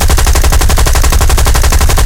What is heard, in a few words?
m1919 loop left, guns